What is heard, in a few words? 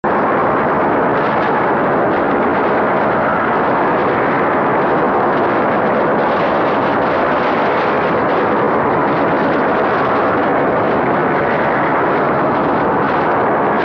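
Static Grinding Ugly, static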